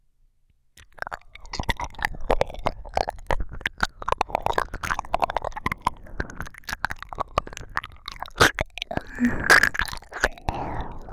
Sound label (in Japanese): ぐっぽり耳舐め